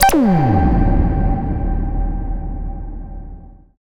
Fail Game; FREE SFX PACK VOL 1

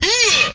Hit, Vocal; retro arcade; MMRetroArcadeSoundsPack

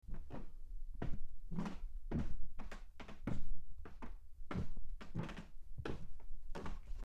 Wooden Foosteps; horror; horror sfx vol 2

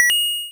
pickup, 8-Bit Sounds

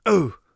hit, Warrior Voice; Warrior Voice Pack

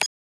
Click Lock Beep; FREE SFX PACK VOL 2

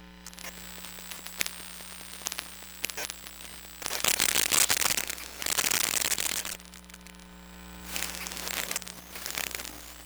Computer Tower, Electromagnetic Sounds; Micro Pack; NazdyNate

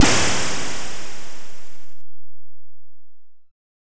Noise Digital Fade; FREE SFX PACK VOL 3

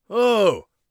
surprised, Warrior Voice; Warrior Voice Pack

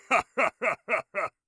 laugh, orc voice; Orc Voice Pack

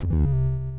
lose, 8 bit sound effects

